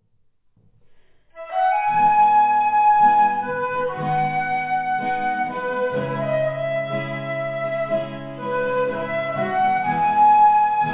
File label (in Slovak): FLAUTA